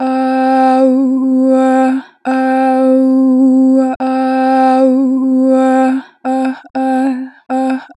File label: Adlib - 2 bar vib, Natural Vibrato, Vocals; Harmor Vocals